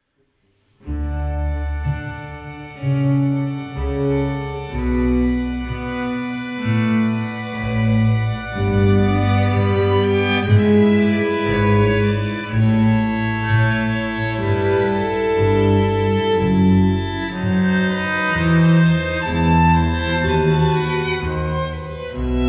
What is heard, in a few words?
bach air gavotte